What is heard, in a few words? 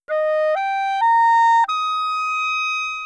Clarinet